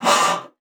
Luft; Xsample VOL-3; STACC+FX

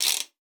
RATSCHE; Xsample VOL-9

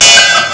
kuckuck; ST-XX Amiga Soundtracker Sample Packs

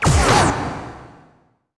RAKETE; Best Service; Advanced Mega Effects

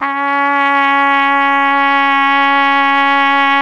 TROMPETE C#2, TRUMPET; Best Service; World Colours

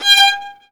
GEIGE, Alpine Volksmusik

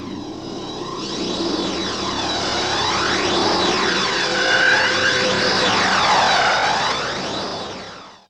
DUESEN; Best Service; Advanced Mega Effects

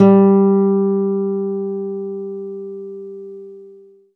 KONZERTGIT, Alpine Volksmusik

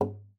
KLOPF, GUITAR FX; Xsample VOL-2

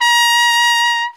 SOLI TROMPETEN, Alpine Volksmusik

